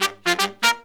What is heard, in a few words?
Pop & Funk Brass, 120; Best Service ProSamples vol.25; AKAI